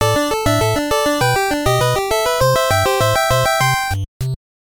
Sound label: Bonus Round Clear; nuts milk; nes